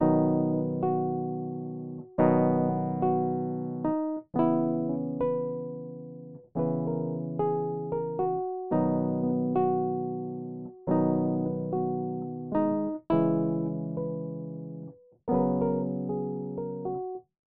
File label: Menu Town